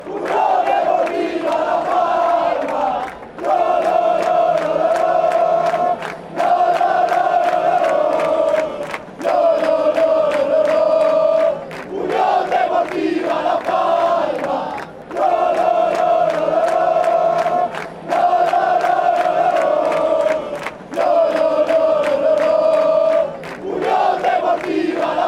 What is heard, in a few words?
union deportiva las palmas fanchants